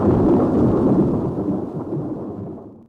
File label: lightning; smbx38a